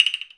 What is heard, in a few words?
Wood, Percussive FX; musicradar; cartoon caper